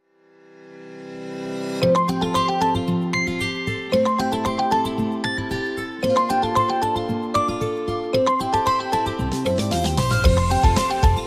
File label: Blue Meteor Showers Vivo FuntouchOS 9 Ringtone